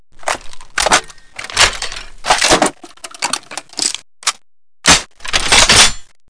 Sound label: reload mg